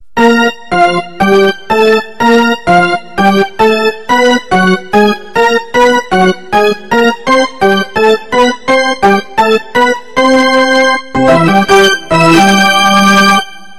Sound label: Baseball Charge Organ Sounds Car Horn